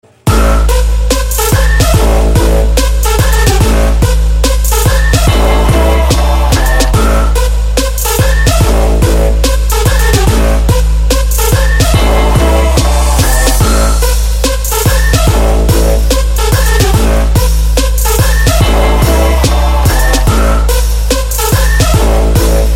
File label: trap song